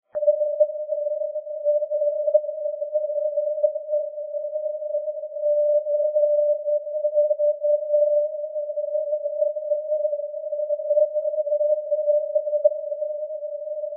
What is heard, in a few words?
ndb calvi st catherine cv 375; europe